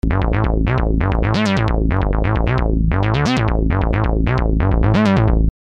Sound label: autoporta